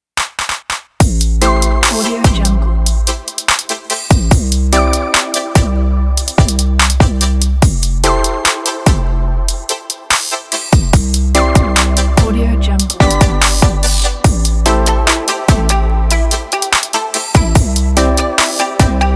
podcast intro vlog

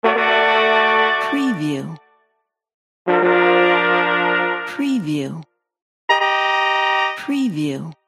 ta da brass

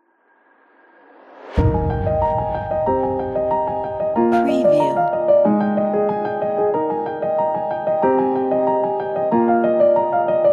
piano in the sky

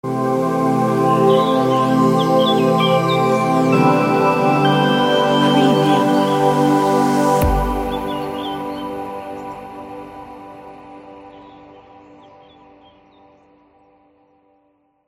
fairy forest logo

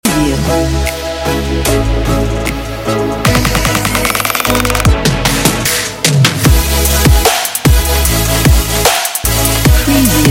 future bass promo

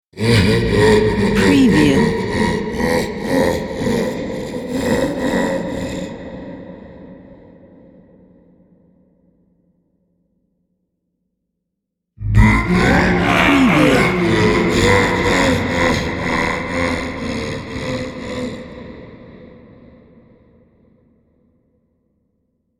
evil laugh sounds

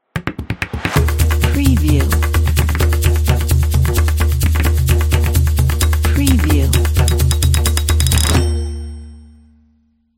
drums intro logo

